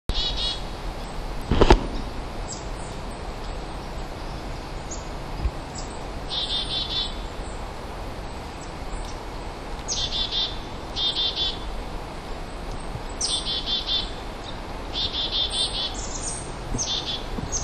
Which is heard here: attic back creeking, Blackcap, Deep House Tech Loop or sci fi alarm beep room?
Blackcap